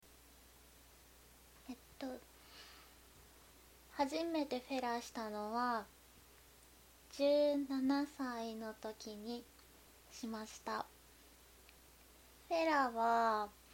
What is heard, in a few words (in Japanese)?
フェラ